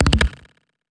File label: ballbackpack